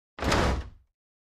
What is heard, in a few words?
Large Chest Slide